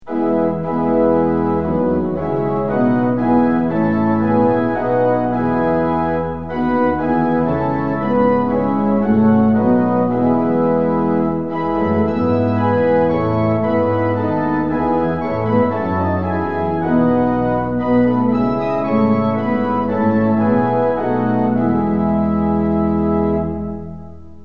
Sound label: tune; hymns; lillenas